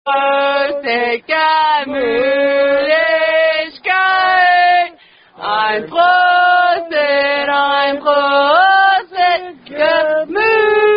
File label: Unknown German Hash Down-Down Song Sung